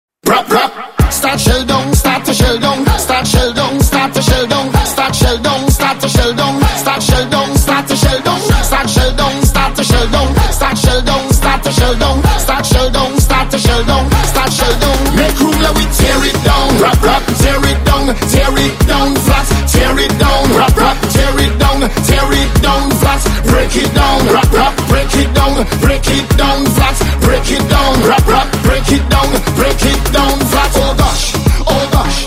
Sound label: soca